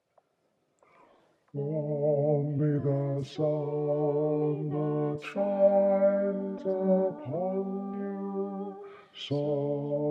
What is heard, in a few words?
bass